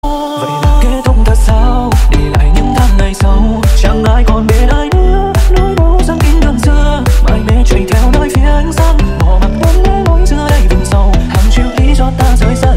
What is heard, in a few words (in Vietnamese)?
nhac chuong remix